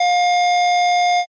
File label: censor beep